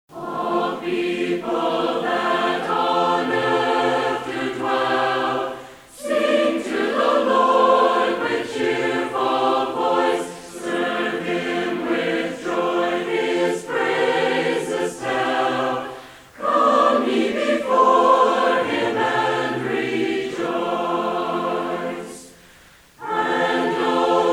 Sing To The Lord With a Cheerful Voice, Hymnal Chorale; Church Materials